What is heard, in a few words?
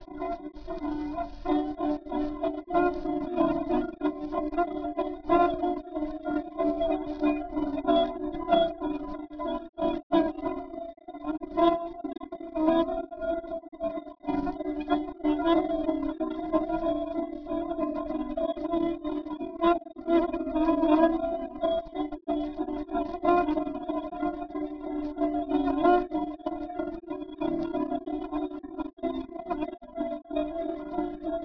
Jazz